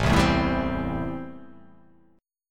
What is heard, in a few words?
A-Minor 7th A; Am7 strummed; JGuitar